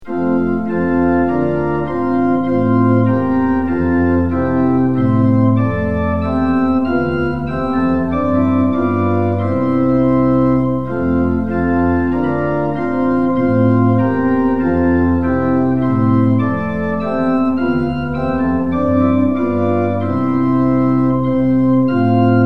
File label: tune; hymns; lillenas